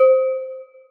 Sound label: celesta, programmable speaker